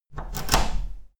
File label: door key in out